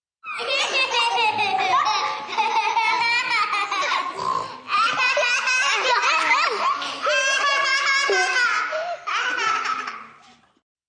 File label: Group Snort Laugh, Laugh, Group, 4-8 small children, snort, comical, some individual laughing; kids, males, females, girls, boys, human voice; VoiceBox